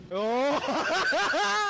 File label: ahasahahah